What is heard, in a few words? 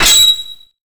metal hit